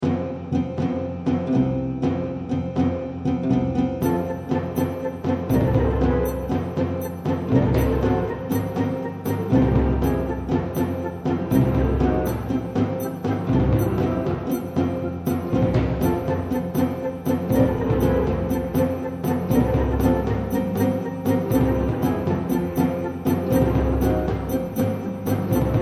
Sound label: theater music Greek Dance; Lysistrata